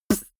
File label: beatbox snare punchy dry